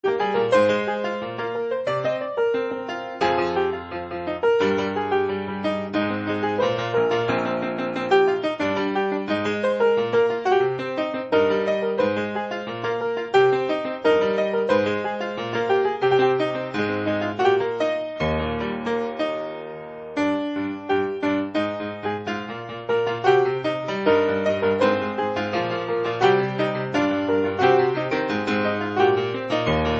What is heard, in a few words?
Super Cycle (grand piano)